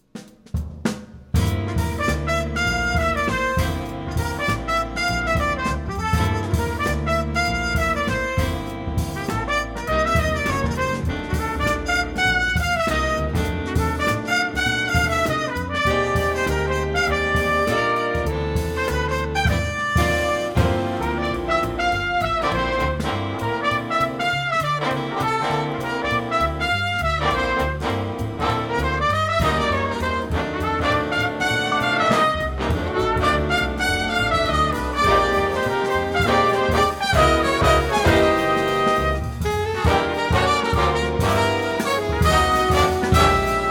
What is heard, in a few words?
blues